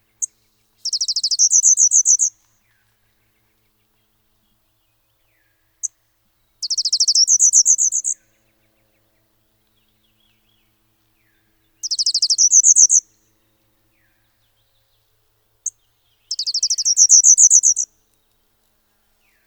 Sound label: Golden-winged Warbler, Vermivora chrysoptera; warblers